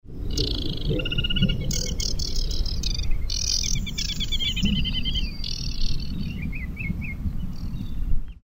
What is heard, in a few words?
aquatic warbler